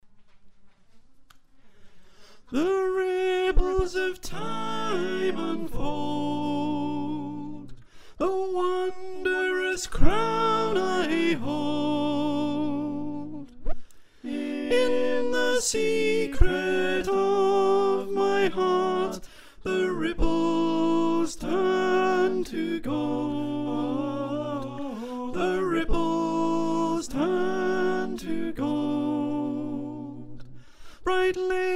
Soprano, choir

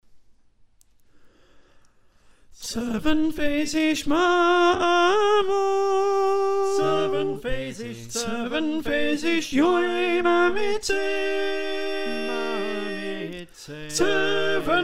Alto, choir

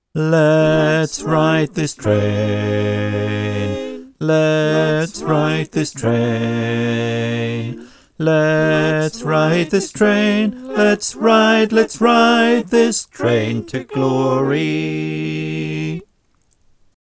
Bass

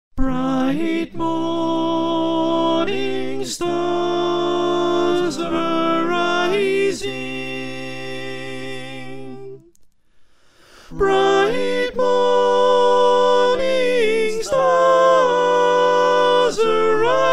Soprano

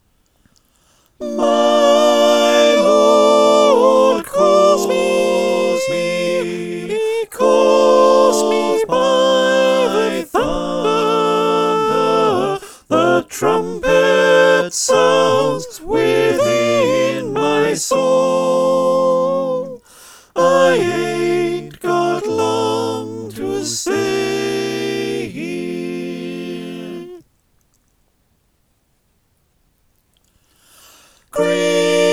SATB; Steal Away v1&2

